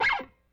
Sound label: Rickenbacker Bass; Airwindows